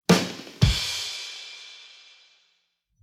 rim shot

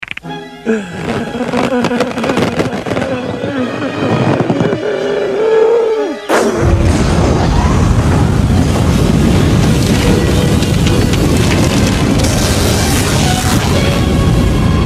huff puff

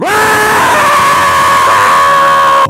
screaming

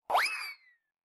fling sound

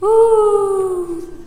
owl hoot

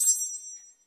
bell plate

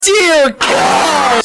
gaaahhh

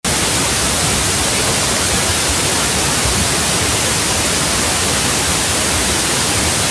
raining sound

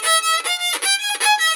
indian violin

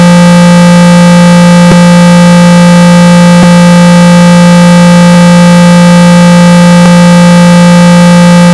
death screen

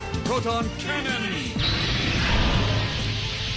proton cannon

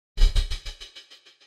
alert sound

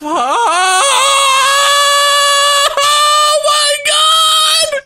pause falling

